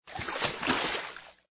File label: plant water; pvz